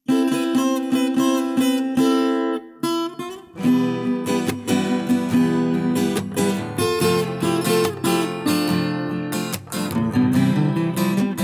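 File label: orchestra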